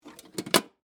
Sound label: Lunch Box Close, Foley, Lunch, Box, Lid, Close, Metal, Case; Lids; Objects